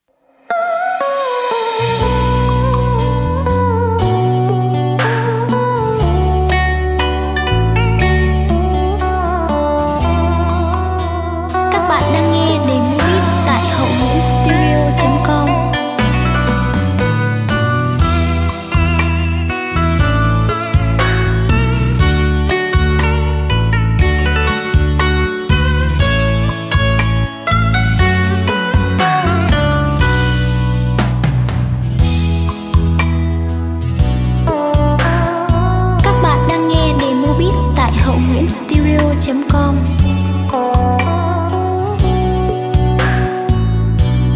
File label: beat, playback